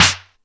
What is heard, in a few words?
All Snares, E-MU Pro-Cussion